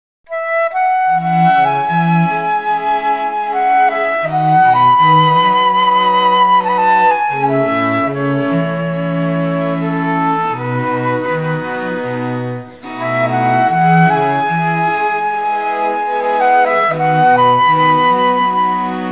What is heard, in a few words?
Strings